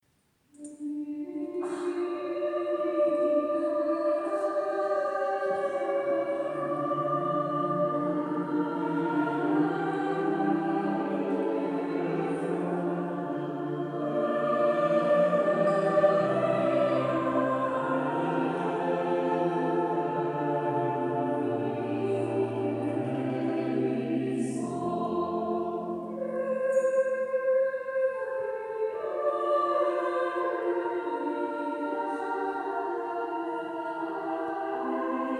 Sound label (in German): Chor klasisch